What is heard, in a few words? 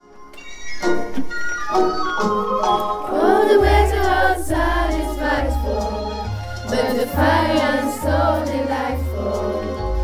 Chorale; Noel 2019